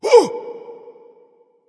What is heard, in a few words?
grunt hurt; smite; voicepack; hun batz